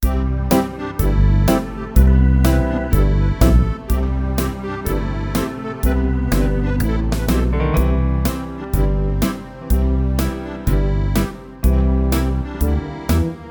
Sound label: JINGLE BELLS SLOW 62